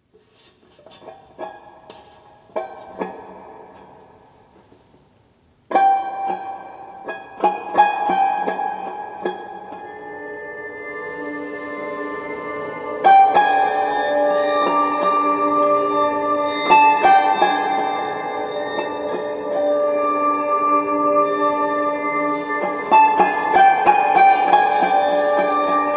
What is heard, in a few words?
Suspense